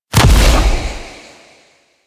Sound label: Sow Impact; Warframe; Wraith